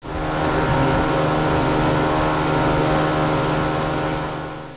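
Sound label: SPEEDBOAT; 10,000 Graphics Pack Volume 1; Media Graphics International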